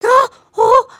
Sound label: fear; emotes